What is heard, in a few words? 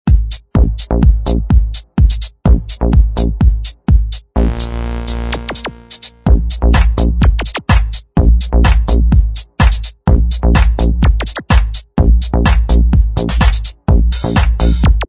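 Inst Track, instrumental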